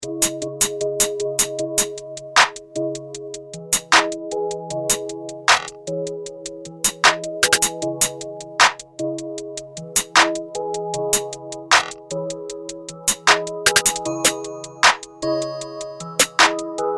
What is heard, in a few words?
145 Bpm